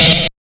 HARD 130 FX, Big Beat Drumloops; USB Soundscan vol.25; AKAI

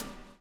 BUBBLE; Spectrasonics - Backbeat

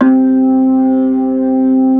C2 SLOW, DISTORTN.B3; Northstar - New Gold VOL-1

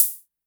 Hi Hats Open; LIMAT Div Dept

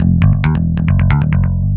BASS, Drum & Bass, 135 BPM; Ueberschall; Drum & Bass Resonance

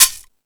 Timbale, E-MU Pro-Cussion

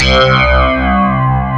pluck C2, FM pluck

